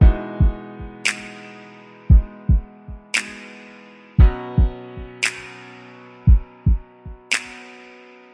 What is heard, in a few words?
115 piano kick and lovesong snaps, synth and electronic loops